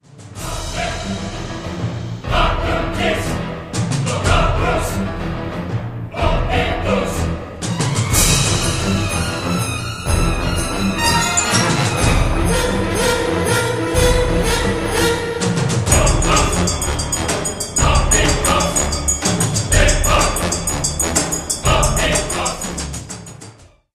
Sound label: chanting; Dahak